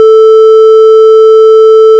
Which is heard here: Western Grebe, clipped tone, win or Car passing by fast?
clipped tone